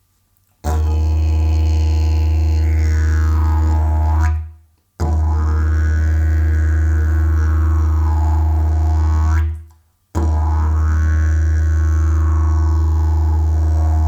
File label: didgeridoo; jasan rudozor